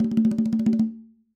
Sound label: conga Roll Short; Raw Perc One Shots; Slate; SD SEISMIC Drum Sample Pack